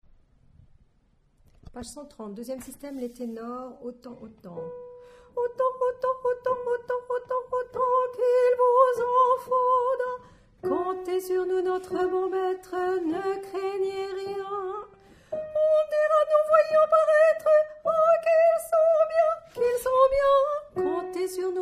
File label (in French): Tenor